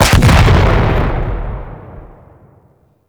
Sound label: RPG Explosion; Hellion Elite